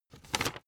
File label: old flip page fast short